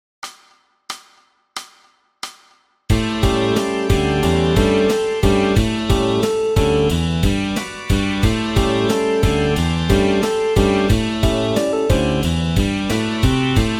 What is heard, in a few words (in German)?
mit Bass